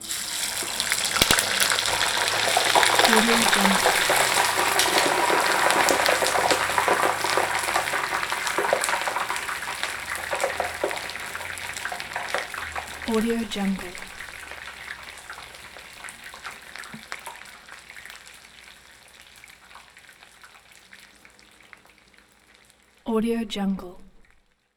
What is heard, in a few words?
household cooking cooking oil french fries; domestic sounds